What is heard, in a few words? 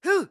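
Jump Attack, voice; Link; BOTW; Breath of the Wild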